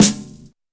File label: sparkly snare g sharp key, steel snare drum sound tuned to the G# note; snare drum samples